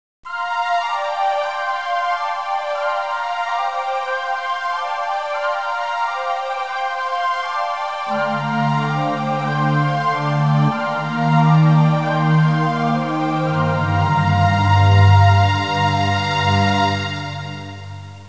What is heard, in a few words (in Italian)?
audio suonerie musica mistica